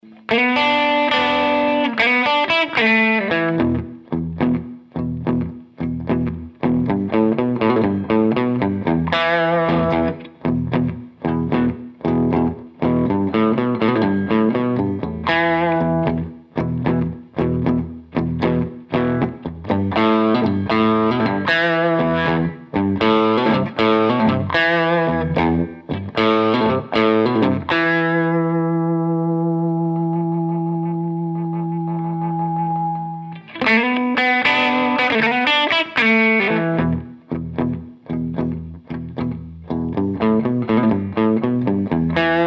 67 JTM45

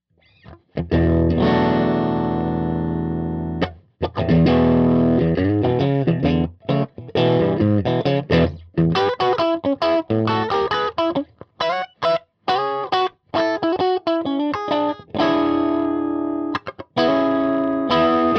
clean strat; Trinity Triwatt Amp

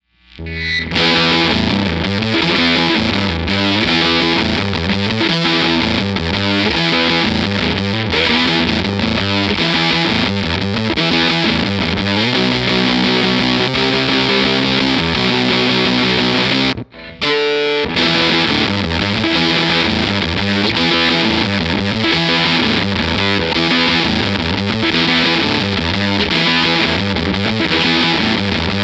12AT7 OD SG Bridge Tone Tubbys Greenbacks; TRIWATT; trinity

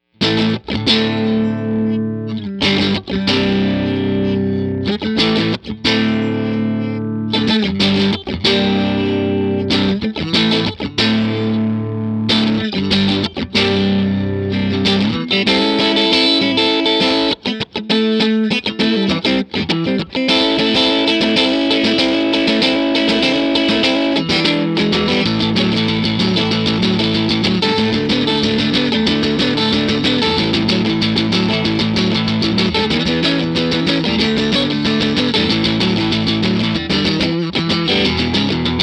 Single Coil Middle; TC15; Ch1 Hi Munch Hi PRS Mira

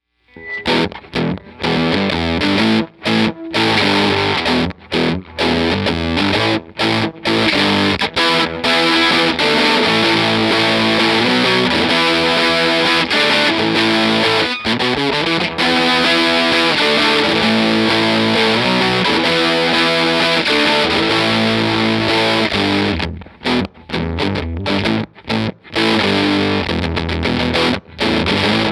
TRIWATT 12AT7 OD SG Bridge Tone Tubbys; trinity

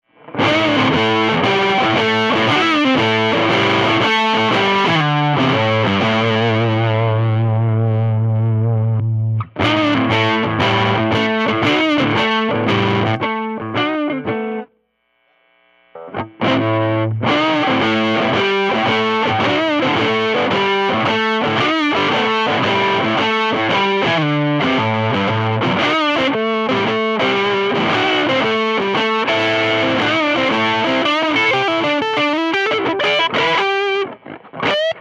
blues, tramp amps; trinity tramp

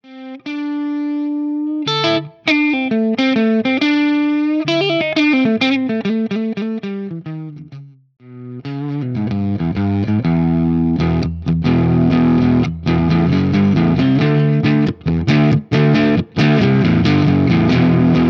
JTM45, Input Hi, Middle; Input 1; SG; Greens

